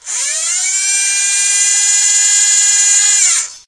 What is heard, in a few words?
sentry move long; tf